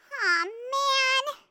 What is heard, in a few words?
hit